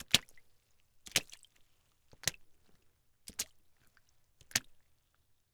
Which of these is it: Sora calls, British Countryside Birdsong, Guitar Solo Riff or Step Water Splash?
Step Water Splash